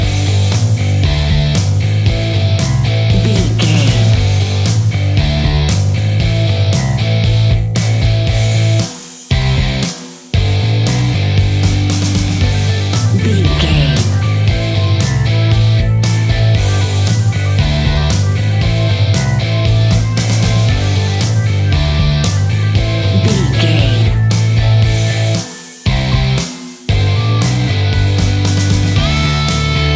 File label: old classic rock music; Old Classic Rock 60 Sec Mix; Catch 22 Music Network